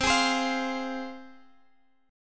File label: C Suspended 4th Sharp 5th, C, chord; strummed; Csus4#5; x,x,10,13,13,13